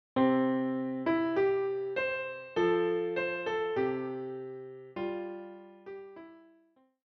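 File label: piano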